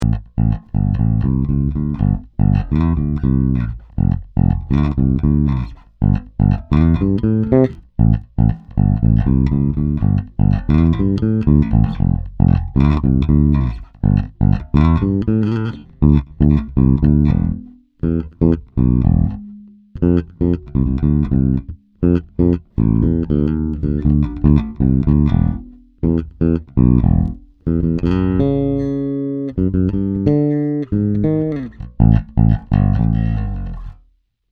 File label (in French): Passif M+C, basses